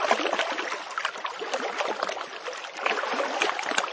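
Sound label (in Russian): вода близкий всплеск звонкий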